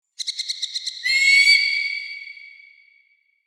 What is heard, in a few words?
Hawk and eagle calling and screeching; bird